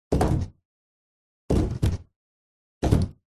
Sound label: door heavy large close hard